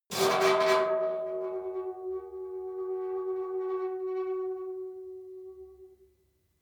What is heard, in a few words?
metal squeal saturated; industrie-wirtschaft